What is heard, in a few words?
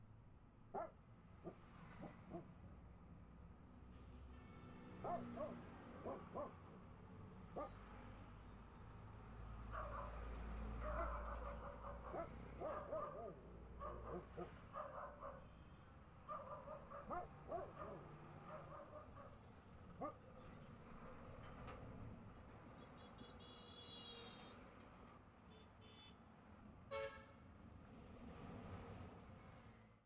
Truck traffic honking and barking after active noise cancellation